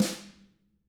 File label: TAMA Drums; TAMAKIT